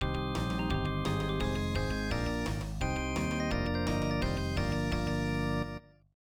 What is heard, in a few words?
Tokyo Ondo (electone); Great Sluggers 94 New World Stadium; namco nb 1; arcade